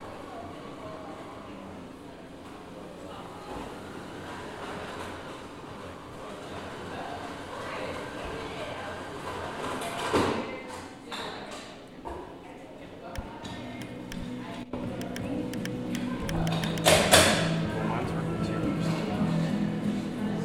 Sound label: soundscape Coffee; pomona; Augies Coffee